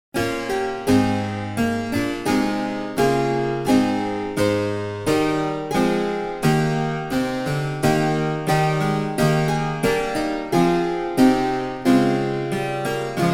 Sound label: German Harpsichord 2x8; German Harpsichord 1738; Passametzo by Elias Nicolaus Ammerbach; edition germanisches nationalmuseum